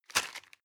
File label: plastic bag small grab hard